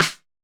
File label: hiphop snare, Effect on